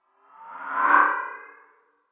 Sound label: more wooshy stuff; REMIXABLE COLLECTIONS; Ze Franks Pain Pack; pain pack sweeps and fx